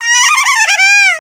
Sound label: gremlin, halloween; Source Engine; tf